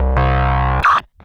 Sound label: BLURP LOW, Hard & Loud Techno